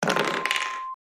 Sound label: dice